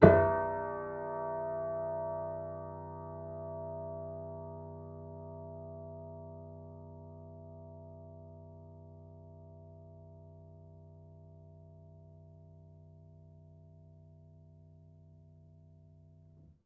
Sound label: Piano Harmonics, Harm Low Mid